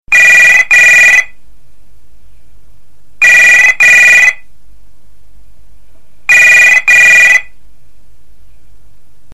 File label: Old ringtones